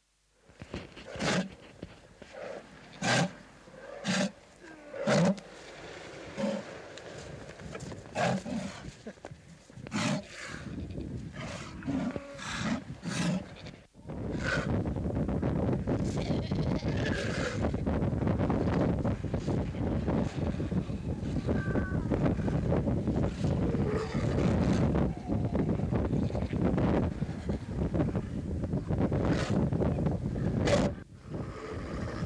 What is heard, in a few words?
sea lion audio, marine mammals; conservation, native animals